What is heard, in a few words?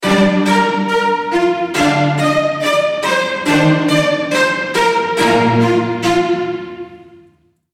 130 160 Melody Strings